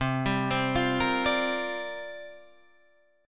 C 7th Suspended 2nd Suspended 4th C down Guitar Drop D